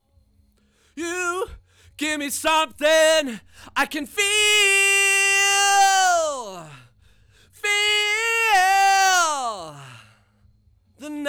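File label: vocal; Pro Tools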